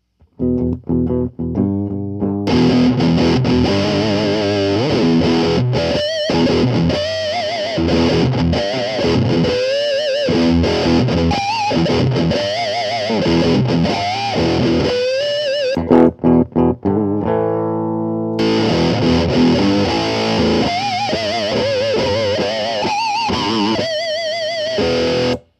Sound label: Squealin'